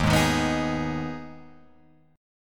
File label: D sharp Suspended 4th Sharp 5th, Guitar, Open F; strummed; JGuitar